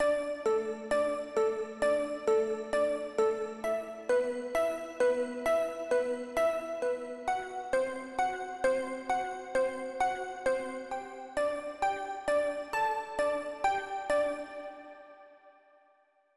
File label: Pluck Melody